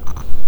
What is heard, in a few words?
Noises after tas6584-Q1 initialization is completed